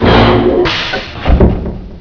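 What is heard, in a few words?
door fireplace door down; Inside Mac Games; Myst Demo; Myst Preview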